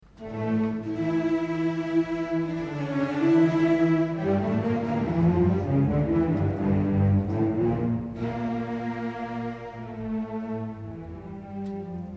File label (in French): recitatif vc cb; récitatif instrumental; confié en unisson aux contrebasses et violoncelles; Le choix du violoncelle, instrument réputé le plus proche de la voix humaine; Beethoven